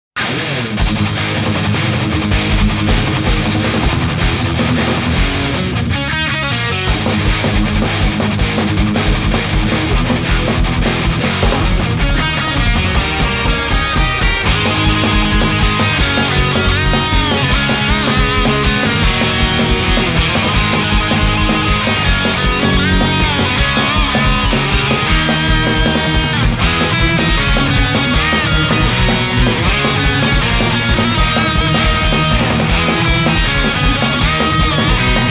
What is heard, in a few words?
over drive